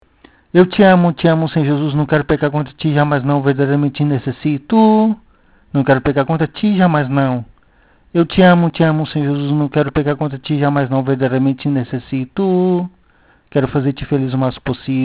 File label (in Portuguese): em so voz